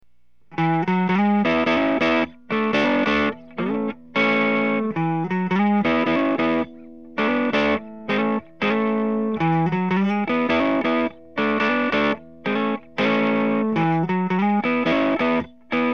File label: Blackheart Little Giant Dirty